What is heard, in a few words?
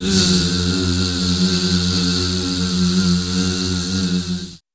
WEIRDOS, Voice Spectral